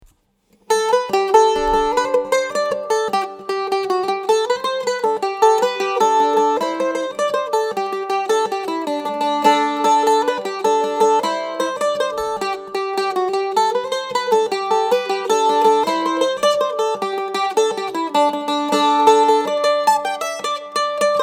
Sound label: mandolin; woods; caolrua